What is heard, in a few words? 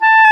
WND CLAR, Clarinet Sh